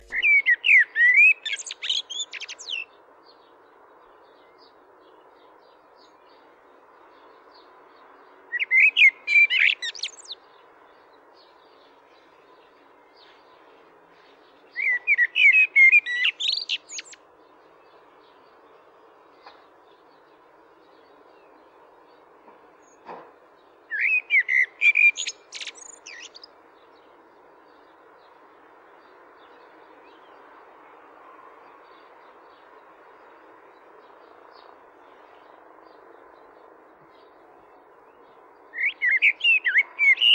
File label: Blackbird